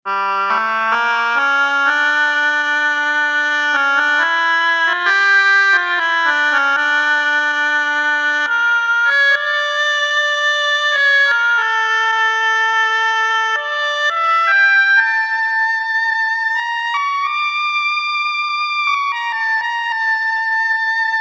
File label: oboe